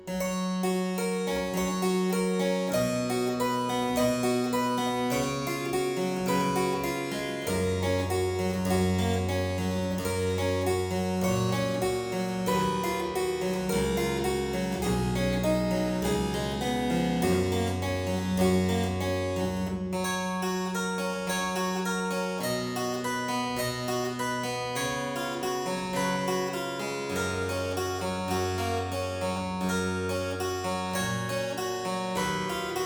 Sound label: Aria in G major Partita 23, Harpsichord